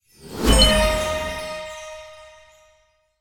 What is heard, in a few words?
Medal ARGENT